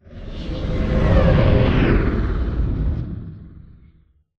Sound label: deploy from mega; Titanfall 2